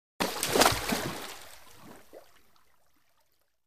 Swimming Dive Splas

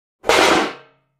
Aluminum Pan Impact; barrels buckets & cans

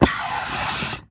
Pneumatic Door Mech

